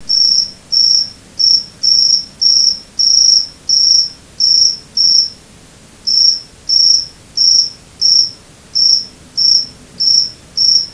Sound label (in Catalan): cricri, grills